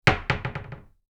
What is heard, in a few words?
cabinet door close